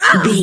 voice female hurt pain

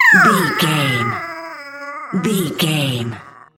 goblin fairy death

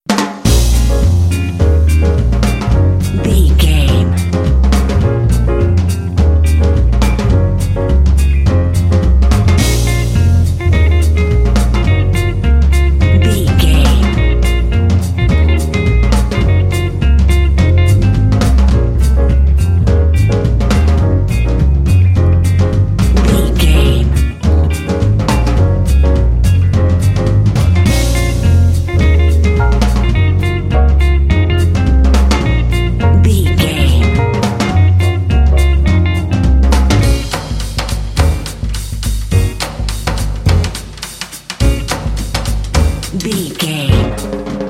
jazz django swing

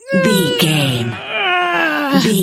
voice female effort push

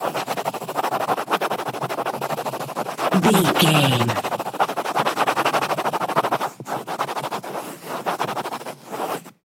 pencil sketch draw write squibble long

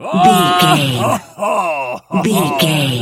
voice male laugh

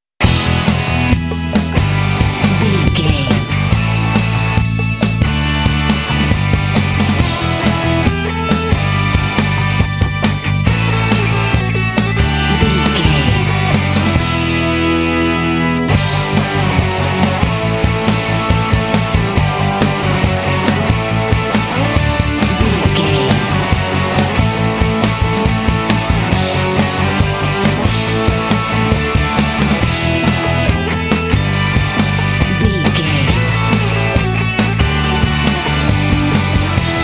indie riff indie riff

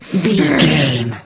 voice female effort growl

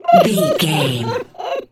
goblin fairy cry whimper

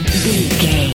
rock power stinger; Sports; Pop Rock; NFL; Football; Sports Music; Hard Rock; Metal; Driving; Bikers

